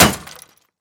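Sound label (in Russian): короткий гремящий звонкий звук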